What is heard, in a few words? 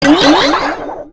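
powerdown; pcdemo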